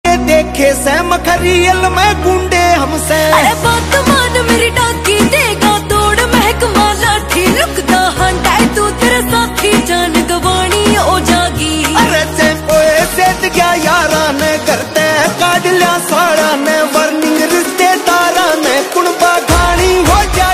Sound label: Warning Ringtone; Haryanvi song